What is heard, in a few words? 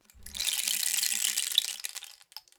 Rain Stick, Percussion Samples